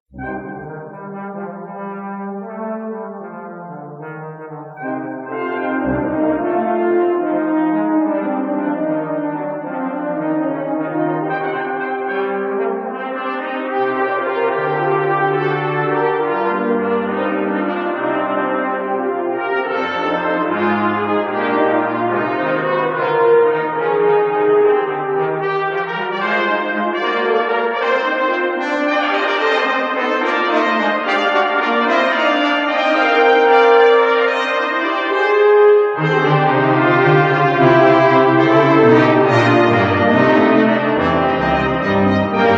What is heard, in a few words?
Brass